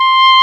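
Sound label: woodwind C1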